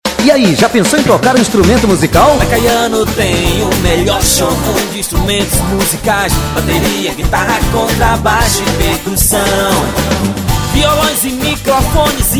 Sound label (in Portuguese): jingle loja de instrumentos